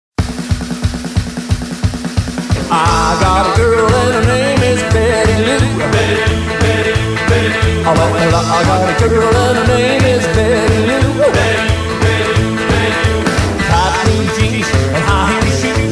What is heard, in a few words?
Rockabilly Party